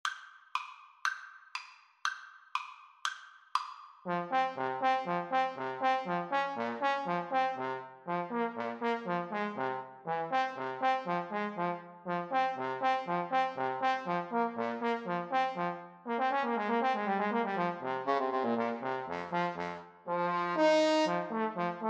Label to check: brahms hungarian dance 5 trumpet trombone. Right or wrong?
Right